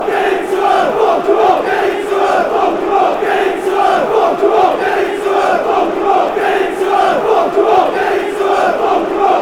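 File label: get em leeds, football chant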